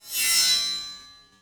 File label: sword fly